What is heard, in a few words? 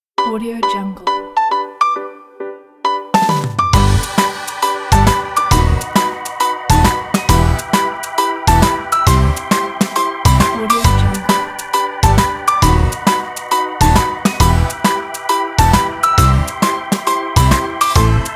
autumn acoustic happy upbeat music kit